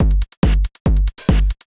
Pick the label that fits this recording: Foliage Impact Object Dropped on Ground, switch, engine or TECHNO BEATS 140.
TECHNO BEATS 140